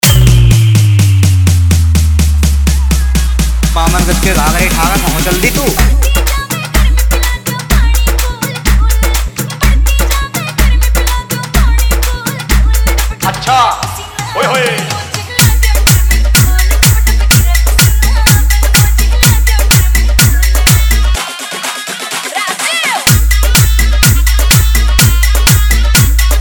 Edm Remix Song

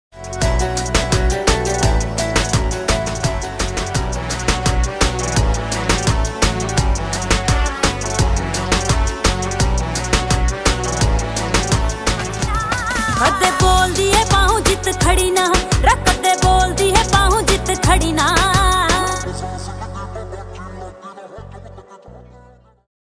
New Harynavi Song

